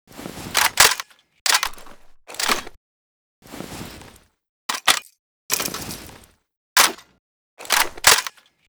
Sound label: pkm reload; STALZONE